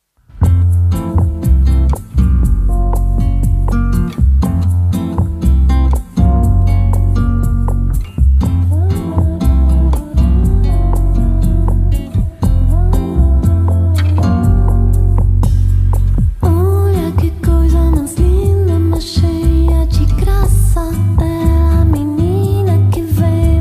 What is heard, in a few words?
bossa nova full album